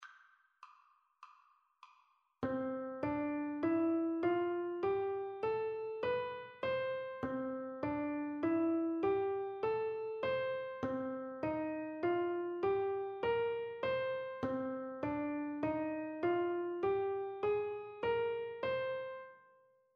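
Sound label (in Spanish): esclas mayor pentatónica mayor y menor menor natural do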